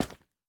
蜡烛踩踏声

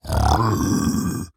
猪灵蛮兵愤怒声

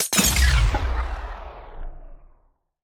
重生锚耗尽声

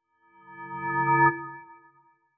钟共鸣声

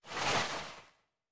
旋风人滑动声

